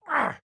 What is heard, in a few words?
hurt light; joker